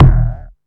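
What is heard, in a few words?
MISC Right, Techno 138 BPM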